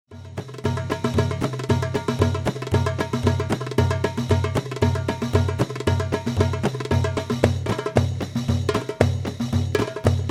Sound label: tabla solo; kathara